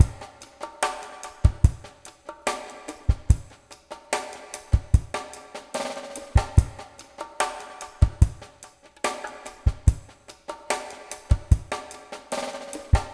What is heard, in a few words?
BOLERINO; Spectrasonics; Liquid Grooves 1 & 2